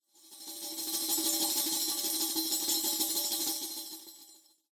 prize rollup; Eternal Wishes LuckyTap

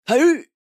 vox tall Guy throw; Highland Reels

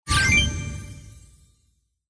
payline green; Crystal Furnace

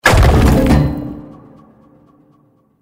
swap smash; Stampede Call of the Pride; Eyecon

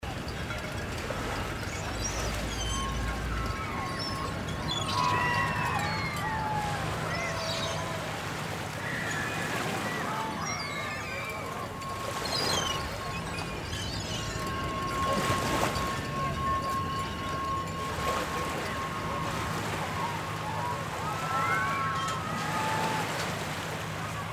music background ambience combined; Lucky's Fish and Chips

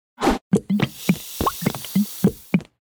intro chilli sfx; without music

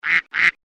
quack double, Ducks; Fluffy Arcade: Doubleup Ducks LuckyTap; Eyecon

